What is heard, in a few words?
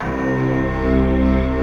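C2, JAPANESE FLUTE; Best Service; Advanced Media Trax Modern Composer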